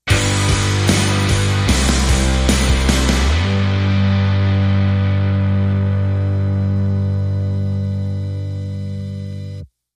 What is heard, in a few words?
Sting